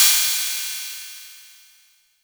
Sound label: Cymbal